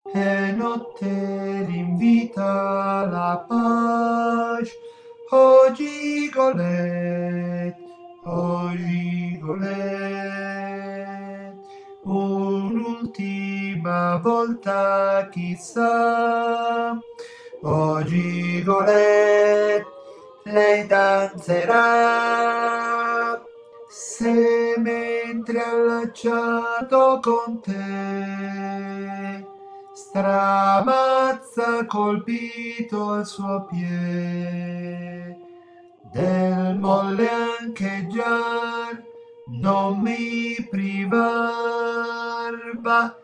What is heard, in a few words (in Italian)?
operetta alti; operetta 05 alti